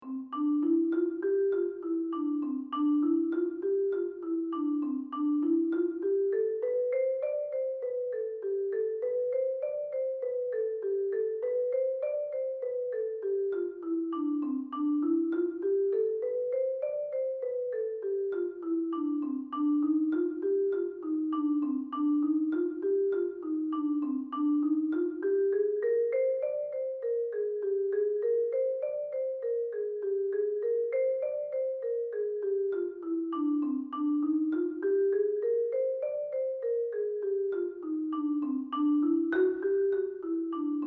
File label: Front Ensemble Warm-up, Percussion; Racine Scouts 2017